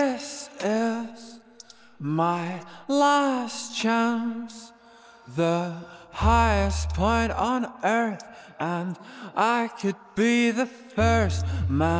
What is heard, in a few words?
vocal; sgmse